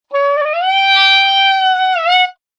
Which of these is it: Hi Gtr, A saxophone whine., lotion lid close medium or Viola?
A saxophone whine.